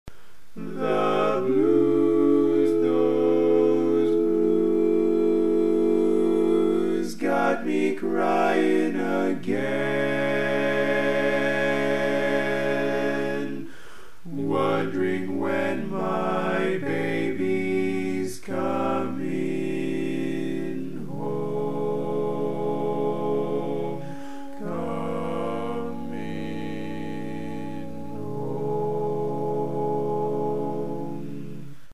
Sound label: Tenor, barbershop